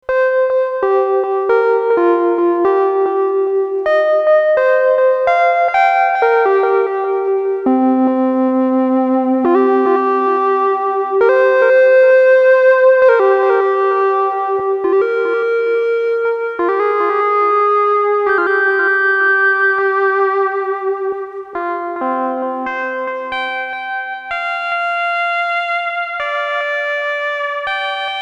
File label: SH 1000 Solo Delay